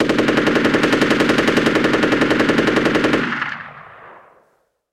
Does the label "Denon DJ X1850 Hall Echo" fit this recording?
No